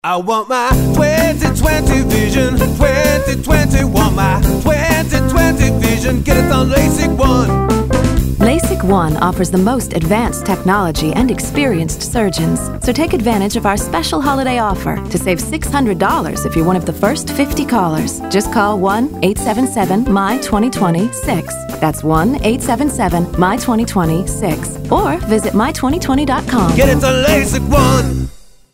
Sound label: rock